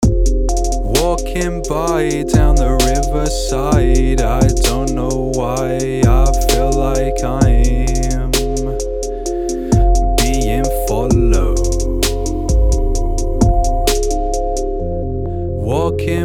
vocal raw; music theory for vocal tuners harmonizers; waves

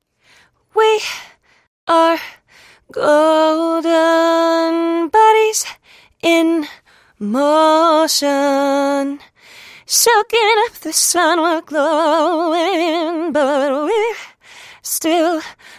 Vocal Bender Modulation; waves

